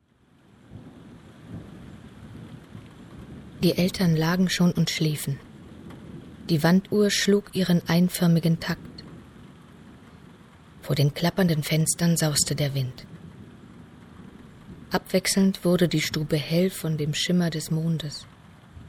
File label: Cello; Novalis